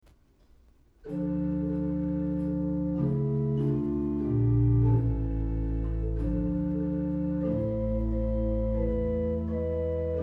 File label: Hymn, organ; Heinlein 1676